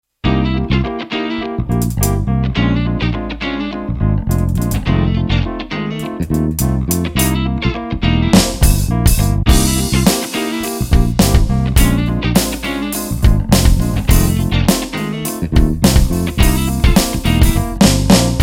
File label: Cool Funk